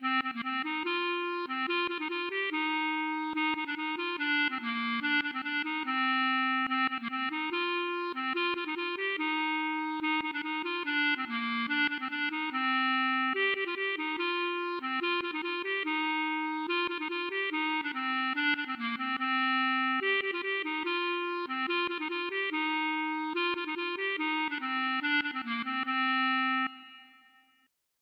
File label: klezmer